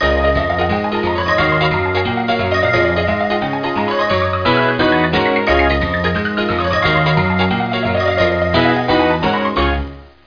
rabbit hop

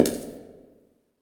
Dungeon; kult

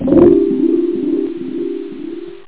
harp; donut

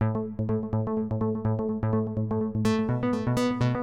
SYNTH HOUSE 125 A; eJay; Keys

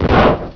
HIT; XWINGTIE

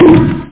hit head; penguins

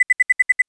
Mine Arm; blobwars

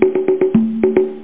congas; The Cruncher 1.1; Cruncher Candy

